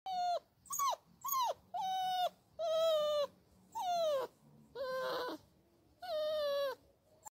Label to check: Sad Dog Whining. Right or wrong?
Right